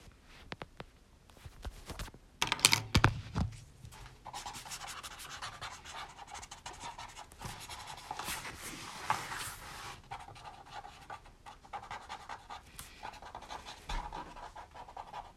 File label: noise